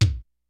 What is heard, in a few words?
kicks snare, kid; Kid Nepro; Elite; KICKS SNARES